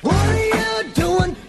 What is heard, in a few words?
what are you doing SUNG